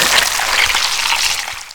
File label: flow; Garry's Mod; tf2